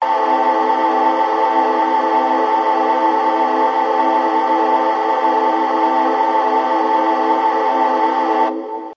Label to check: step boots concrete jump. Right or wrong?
Wrong